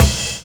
RIDE FILL; Univers Sons

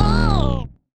bend down; Sonic Foundry (Sony Creative Software); Ugly Remnants VOL-2

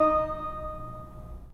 3D Pipe Mix Celeste, 3D Organs

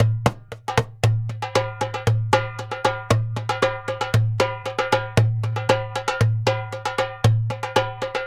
Doumbek, Arabic; Sonic Foundry (Sony Creative Software); Continental Drift Aftershocks

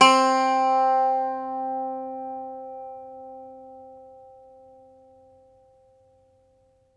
IRISH BAZOUKI, Plucked Strings

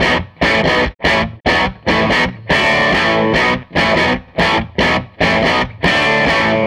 SG Guitar Loop

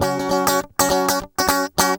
GUI 100 6#F; Best Service Gigapack 2

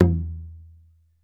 TUBOB, Ritual Drums; E-MU Producer Series Vol. 7 – Old World Instruments

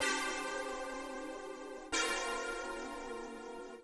125 Soft pad C, Keys, Nu Jazz House; PowerFX - Nu Jazz House

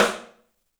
STEEL, Drums, ROOM SINGLE; 3D Sound

